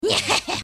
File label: nyahehheh